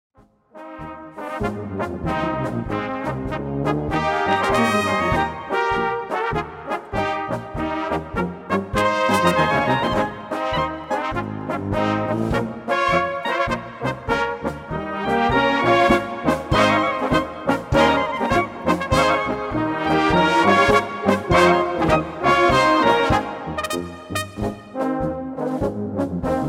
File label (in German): Brauhauspolka